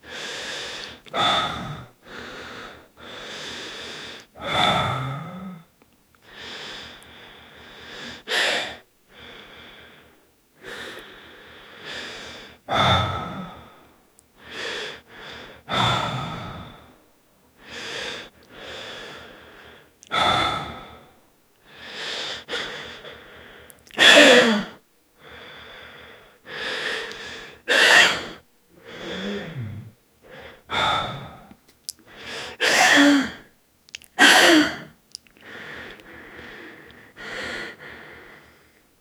18 years old man holding, close mouth

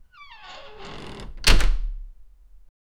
satisfying modern wood door

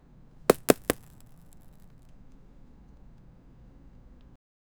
the sound of a falling, wood crack

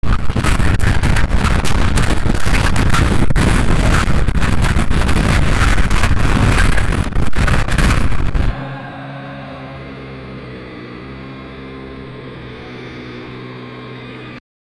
all the sound of the, battle

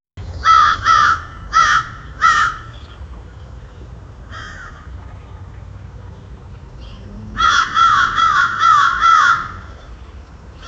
faint crow noises in the

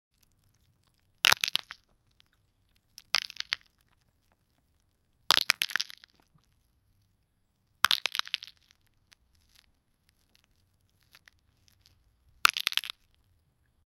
cute game cartoon sound

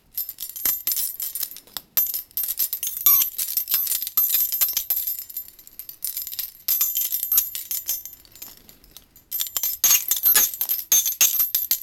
Brass instruments

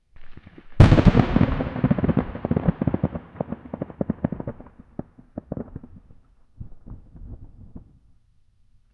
distant thunder and the; echoing

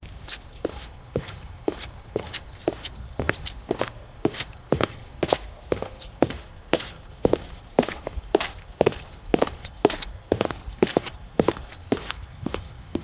barefoot steps, quiet

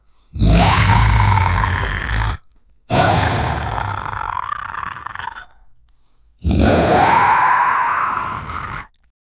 high pitched demonic mons

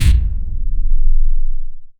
trailer

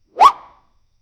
the sound of a werewolf, claw

